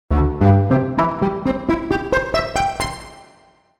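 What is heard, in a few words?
Pizzicato; TURBO VL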